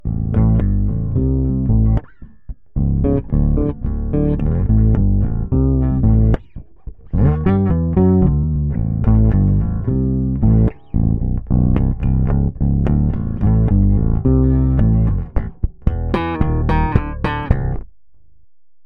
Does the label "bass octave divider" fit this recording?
Yes